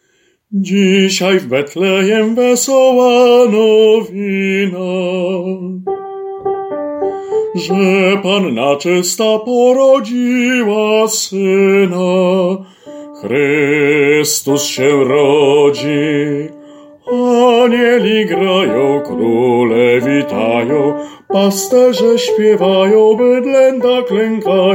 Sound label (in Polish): Dzisiaj w Betlejem ALT